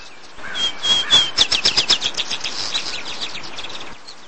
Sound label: Pooecetes gramineus; Chordata; LandBirds